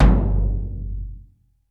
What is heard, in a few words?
DYNA DRUM; Crypton - DrumBase5000; DYNA KITS